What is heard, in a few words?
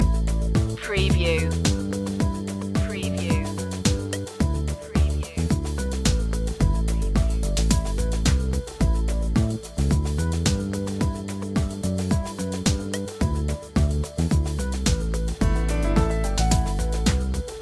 chillwave podcast loop